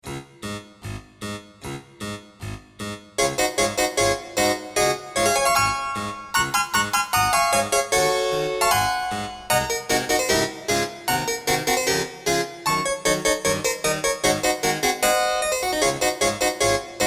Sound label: Danse des Mirlitons Harpsi 31edo; Nutcracker